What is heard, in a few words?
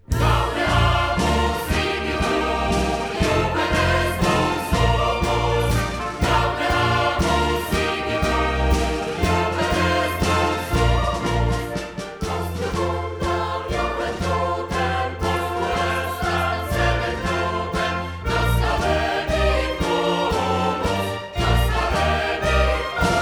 Gaudeamus, piesni senatowe